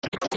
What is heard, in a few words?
Ticktock; OOT